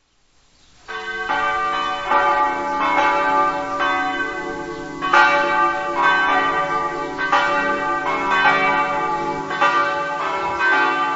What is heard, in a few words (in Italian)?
sanvitot, campane